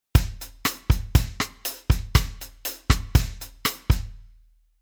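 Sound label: Rhythm Bossa Pattern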